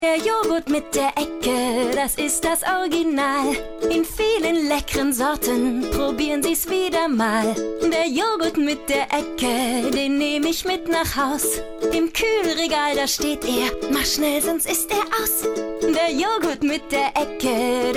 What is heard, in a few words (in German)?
gesang; mueller milch der joghurt mit der ecke; LOFT VOICES